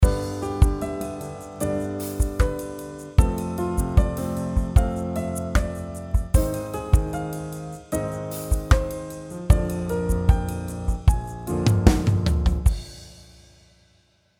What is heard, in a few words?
harm variation; develop your musical ideas